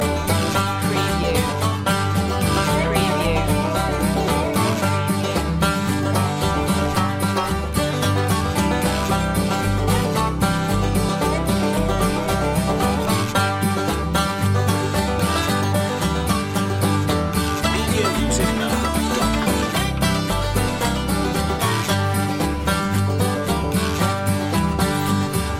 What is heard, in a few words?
farmyard blues loop